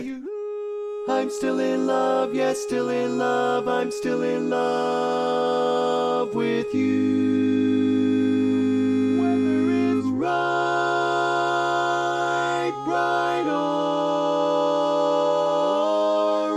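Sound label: Baritone, barbershop tags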